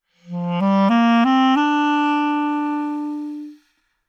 clarinet phrase Bbmaj 100bpm

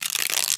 Iguana scales, Foley; SFX; 7rystan's 600+ FREE EDM SAMPLES & DRUMS

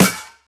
Snare; 7rystan's 600+ FREE EDM SAMPLES & DRUMS; Percussion

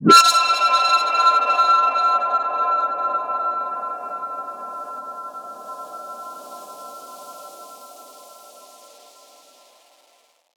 Synth

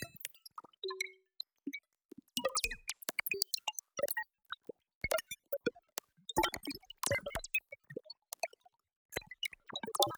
granular logging in, sound design; base pack

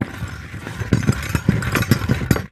Rumbling; Foley; SFX; 7rystan's 600+ FREE EDM SAMPLES & DRUMS

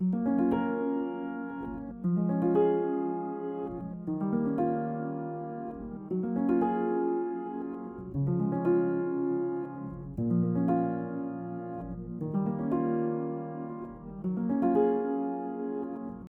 melody Db 118 bpm; bespoke - Floral v.1

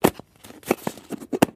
Struggling to open; Foley; SFX; 7rystan's 600+ FREE EDM SAMPLES & DRUMS

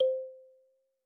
kalimba; bespoke - Floral v.1

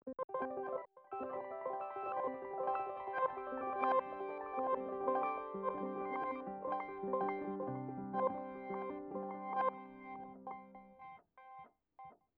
granular piano Cmaj; bespoke - Floral v.2

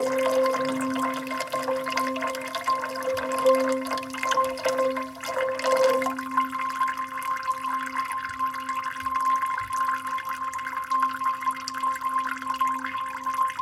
raindrops; bespoke - Floral v.1

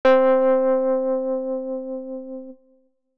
key; bespoke - Floral v.1

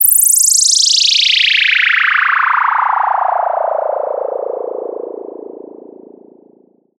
Sweep; 7rystan's 600+ FREE EDM SAMPLES & DRUMS; SFX

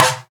snare; 7rystan's Snare Pack 2018

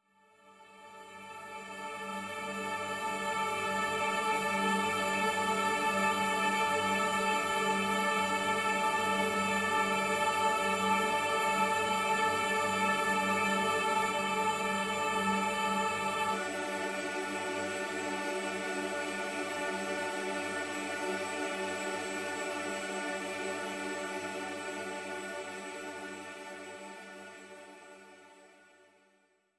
pad hay fever D maj; base pack

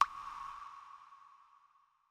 water droplet; fx; bespoke - Floral v.1

